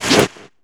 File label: SE SHOJI OPEN; maimai